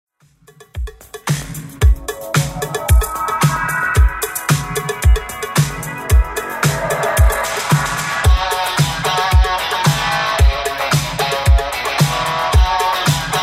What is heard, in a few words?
80s Redrum 112 bpm